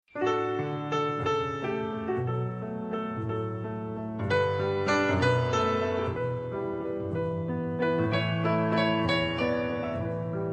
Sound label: Take Me Out To The Ballgame piano; Main Street USA